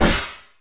SMASH, Anime Sound FX; COMIC

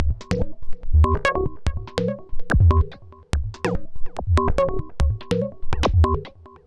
Mod Loop, Modular Percussion

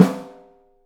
OHSTSN L, 3D Drums

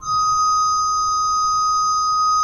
SWL D5, Cathedral Organ, SWELL WERK; Propeller Island

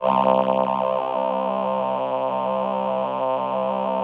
VP-330, String Machines

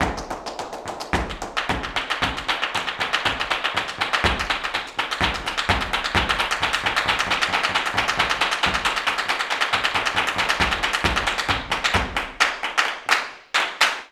Flamenco, JALEO TAP

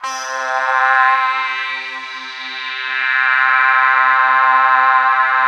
PAD -R, Drum & Bass, 160 BPM; Ueberschall; Drum & Bass Resonance

